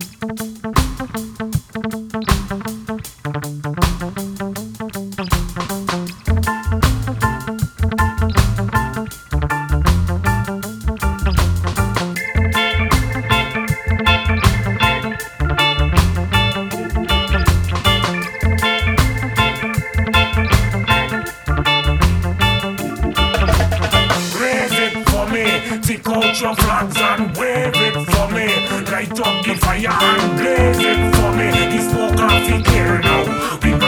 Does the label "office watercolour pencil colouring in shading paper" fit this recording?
No